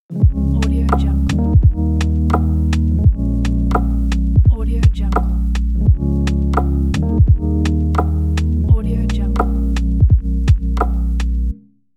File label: lo fi hiphop logo; friendlysound